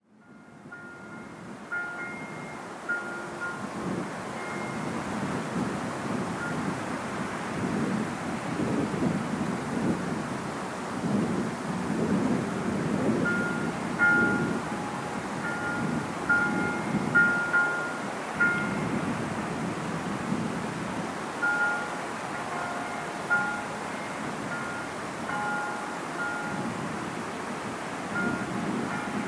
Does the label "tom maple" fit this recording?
No